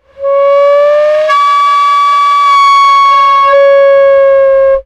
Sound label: sound design effect air pipe pressure